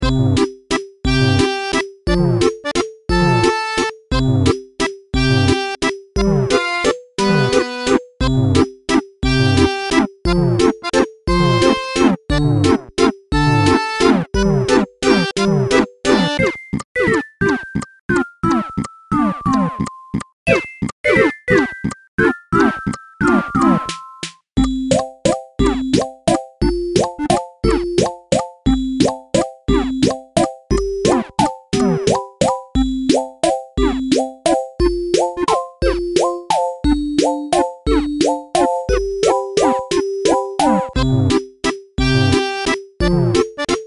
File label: Main Music